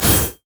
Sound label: riposte; LoL; fiora